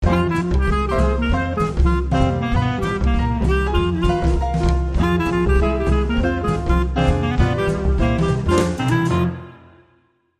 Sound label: Swinging Jingle